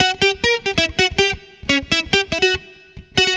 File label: guitar, stringed instruments